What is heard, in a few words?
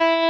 Arp. clean